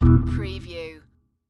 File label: Multimedia Error